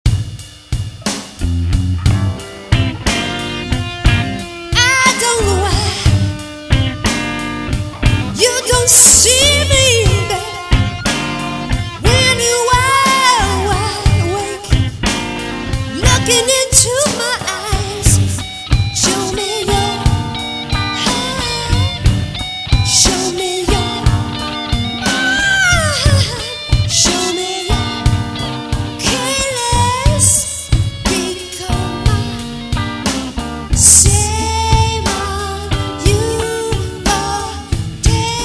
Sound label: blues punk song